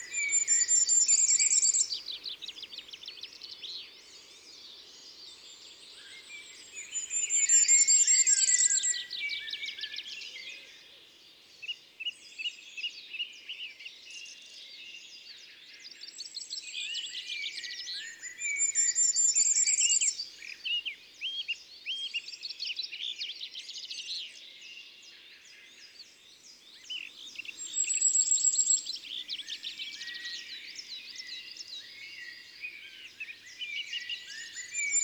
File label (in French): Roitelet a triple bandeau, ABC des chants d'oiseaux